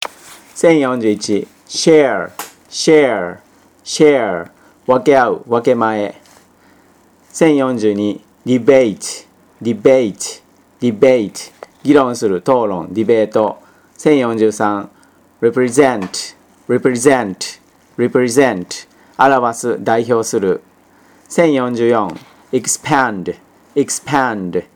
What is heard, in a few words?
tango